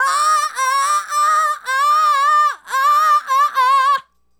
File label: Female Solo, Hip-Hop and RnB Vocals